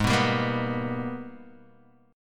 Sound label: G sharp Minor Major 9th, Guitar, Open D; 6,2,5,4,2,x